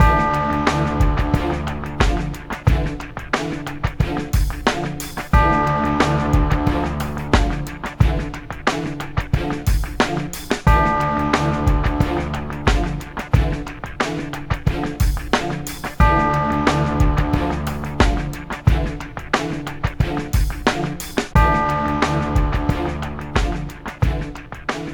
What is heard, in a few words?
Vote Loop; The Jackbox Party Pack 5; Jackbox Games